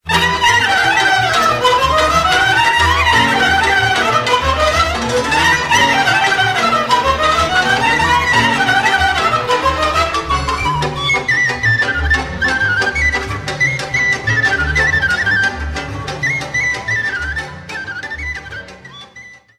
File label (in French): BATUTA, Roumanie